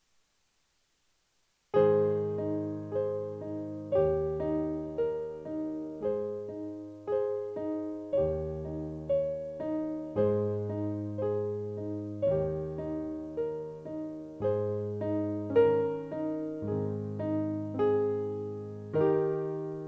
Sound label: Cradle Song Accompaniment